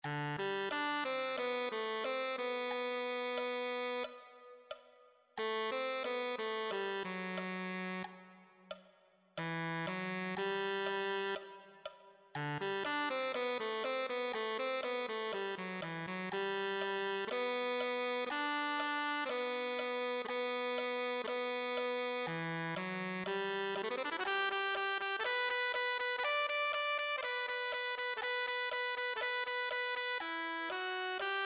tenor saxophone

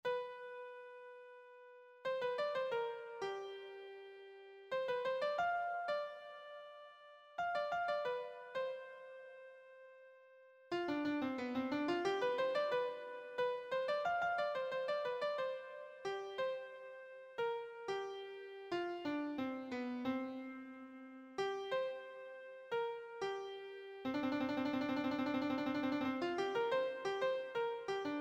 lead, piano

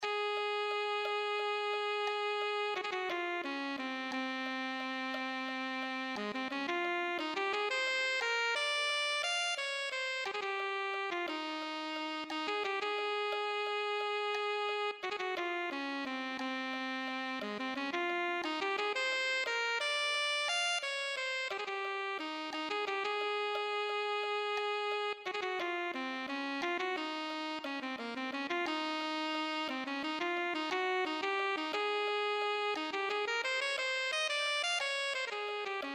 alto saxophone

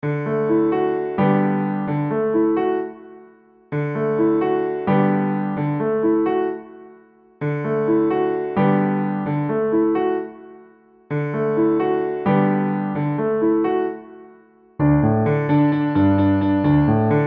solo, piano solo

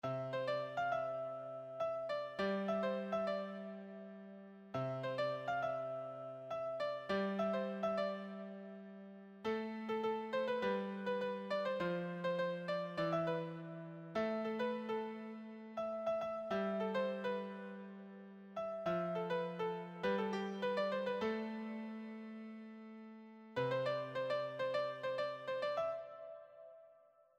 beginner solo sheet, piano

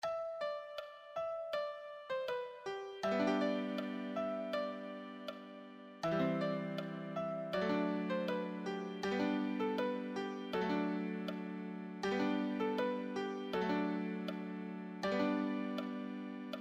solo, piano

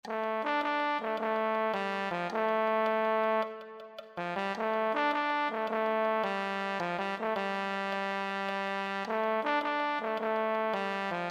euphonium

